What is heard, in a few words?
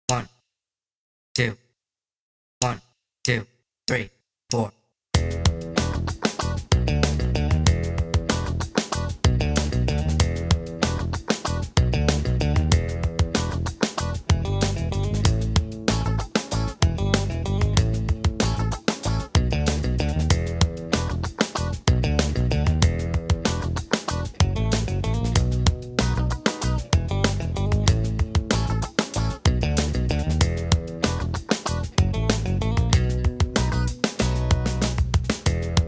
Guitar Funk